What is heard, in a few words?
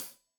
Hat Tight, Hi-Hat, Cymbals; Deeply Sampled Drums